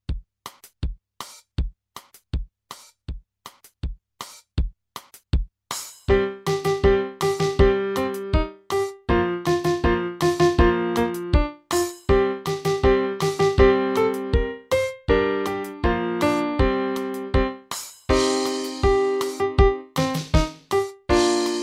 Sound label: Drunken Sailor, 80